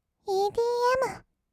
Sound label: EDM; 音楽ジャンル; 1200 kawaii voice!!